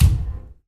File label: bathtub step; Golden Crayon Sounds